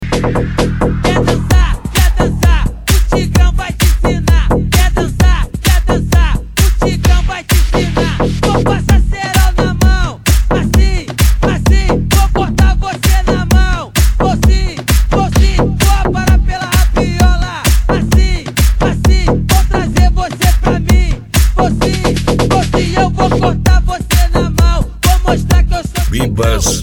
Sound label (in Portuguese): sound car carnaval